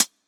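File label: Closed Hi Hat; Synthwave; Drum Samples; HorizonHaze; Futurism; Synthwave Loops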